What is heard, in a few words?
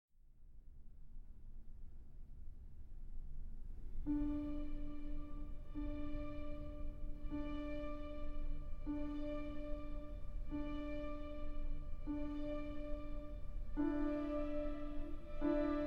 Piano & Ochestra; Oboe Concerto in D minor, S.Z799 II. Adagio